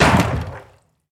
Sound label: ship path break